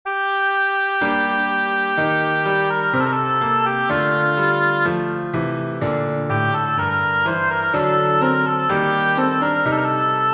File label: choral prelude for oboe piano; Dieterich Buxtehude; nun komm der heiden heiland